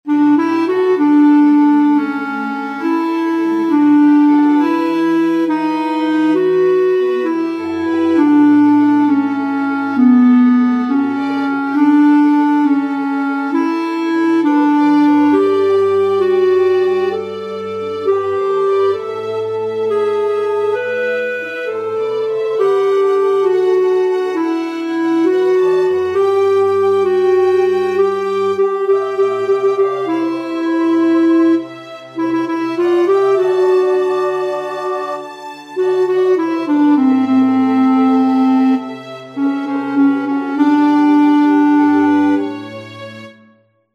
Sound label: Clarinet